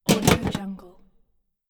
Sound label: tin box close